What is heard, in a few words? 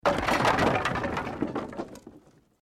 Wood Collapse